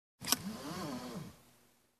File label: Pulling seatbelt